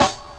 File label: Snare OS; Sonic Foundry; Rondo Brothers Trip-Hop Loops & Samples